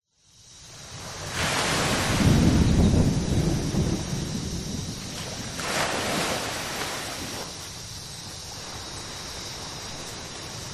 nature ocean waves tropical beach approaching thunderstorm insects storm intensif; storm intensifies toward; zapsplat nature ocean waves tropical beach approaching thunderstorm insects storm intensifies toward